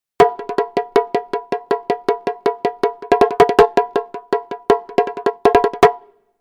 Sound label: Original, Tsukeshime-daiko; Tsukeshime-daiko 1.22; taiko; TAIKO THUNDER